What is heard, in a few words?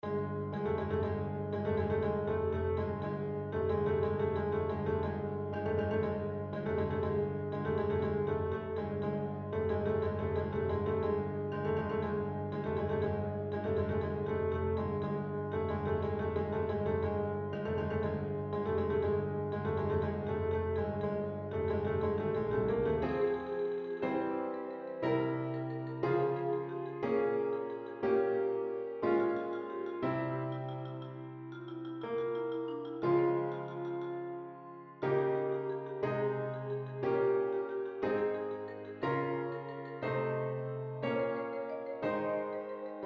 marche funebre